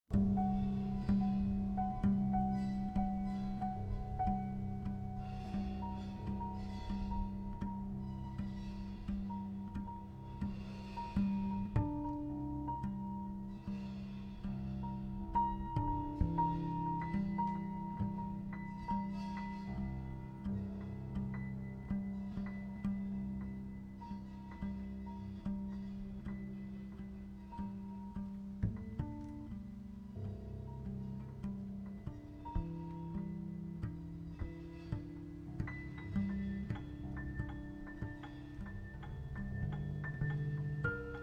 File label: Quartet